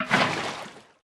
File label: fluid empty; TFC Quick Pockets